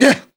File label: death; Sourceforts2.0; player